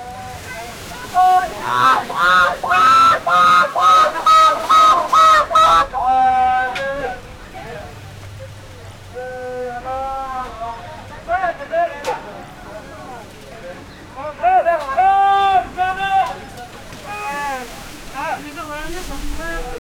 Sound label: chennai kasimedu fish mar, coastal